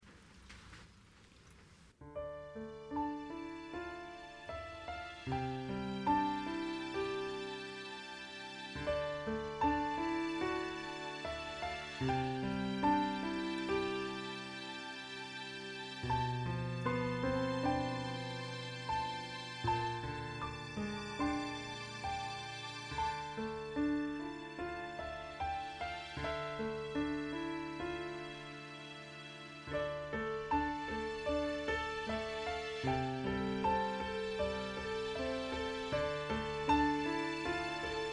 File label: Instrumental